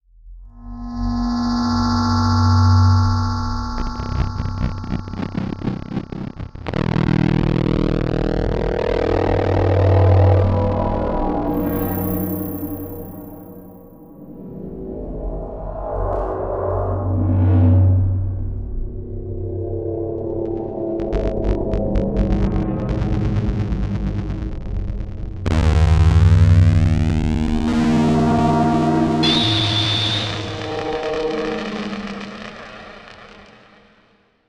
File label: Distortion Random Static Buzz; Module Production Element Title Imaging; Imaging Elements